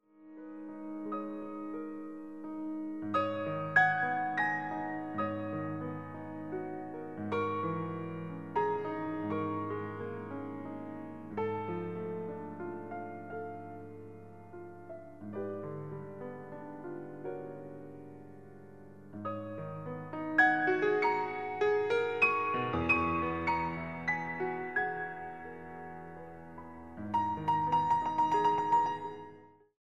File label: piano meditation